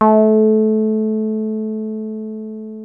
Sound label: 303 A3, TB 303; Hollywood Edge; Giorgio Moroder Rare Synthesizer Collection